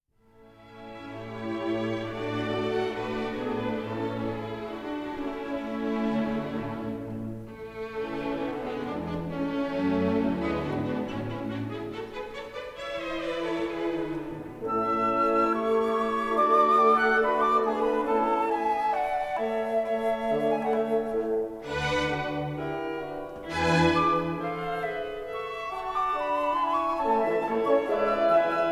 Piano Concerto; Mozart; No.23

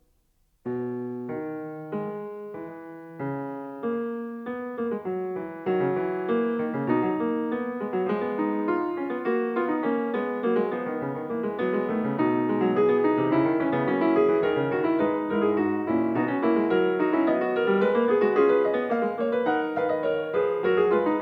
Prelude Fugue No. 23 in B Major Fuga; BWV 892

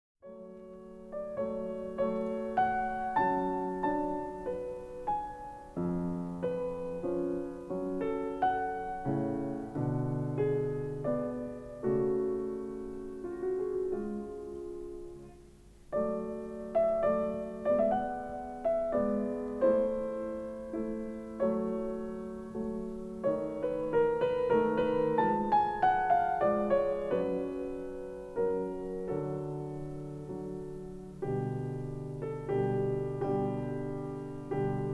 Piano Concerto; Mozart; No.23

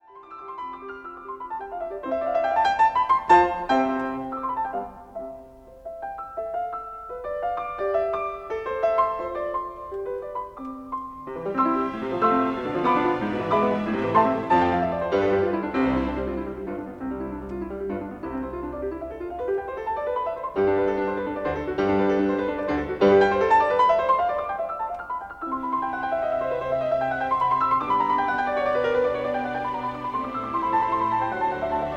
Piano Concerto; Mozart

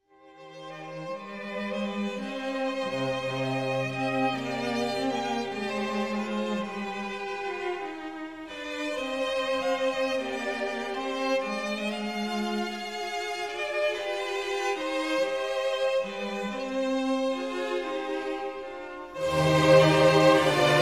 Concerto Grosso In C minor Siciliana; Handel; Concerto Grosso 8; Op. 6 8; HWV 326

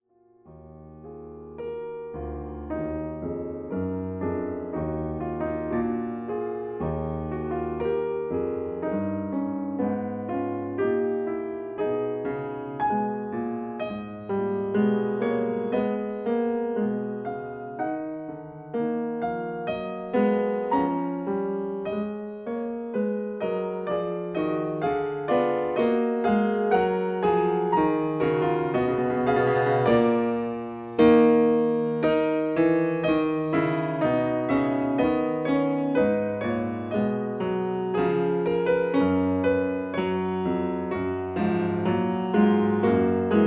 Prelude and Fugue in E flat minor

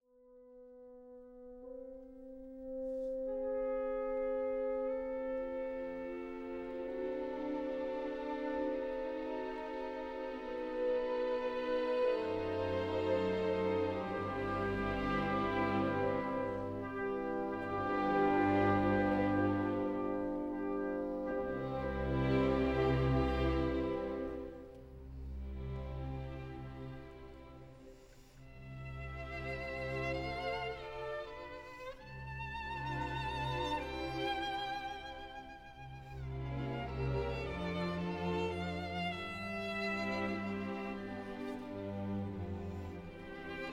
Violin Concerto In E Minor; Mendelssohn

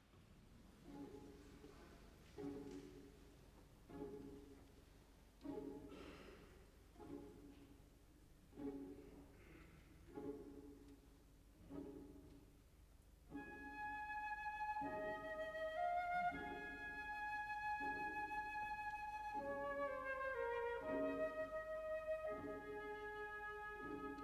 Piano Concerto In B Flat Minor Andantino Semplice; Tchaikovsky; Piano Concerto 1; Op. 23

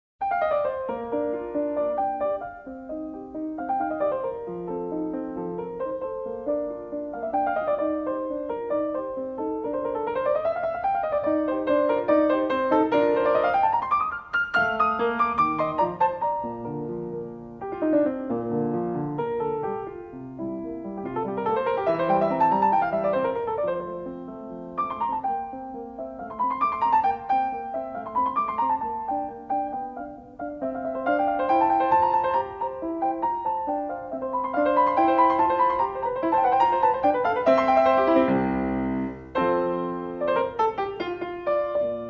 Piano Sonata In B Flat Allegro; Mozart; Piano Sonata 13; K333

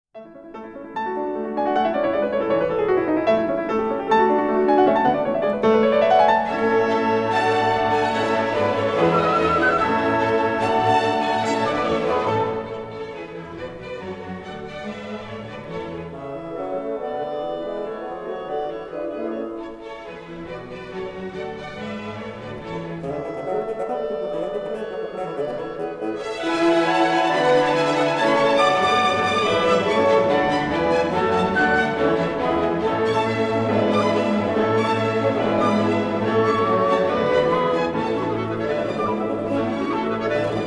Piano Concerto; Mozart; No.23

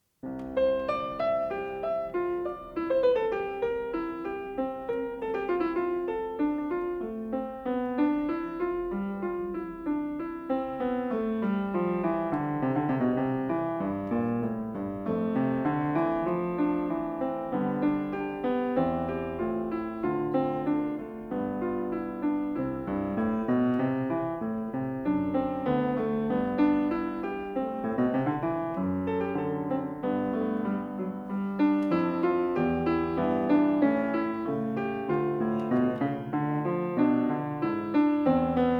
Prelude Fugue in C Major Praeludium; No. 1; BWV 870

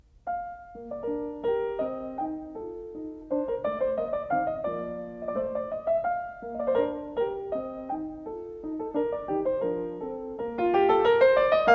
Piano Sonata In B Flat Allegretto Grazioso; Mozart; Piano Sonata 13; K333

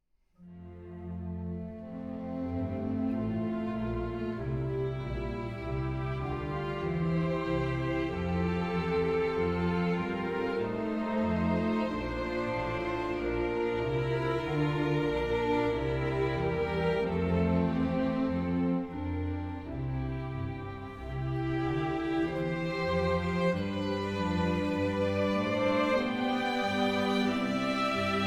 Concerto Grosso In A Minor Largo E Piano; Handel; Concerto Grosso 4; Op. 6 4; HWV 322

